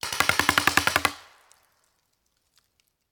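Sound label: Paintball Gun Impact; Sound Ideas; Guns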